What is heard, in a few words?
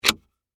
Metal Latch Move, Foley, Metal, Latch, Movement; Latches (Sound Effects:Objects); BlastWave